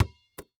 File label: Sports Basketball Bounce On Cement Catch With Hands